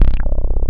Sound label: BASS, JV-1080